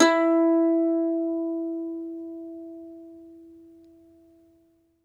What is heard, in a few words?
MANDOLIN, Plucked Strings